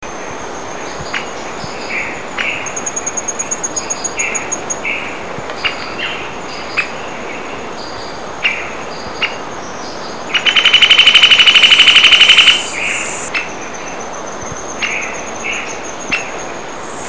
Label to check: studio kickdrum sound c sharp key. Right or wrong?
Wrong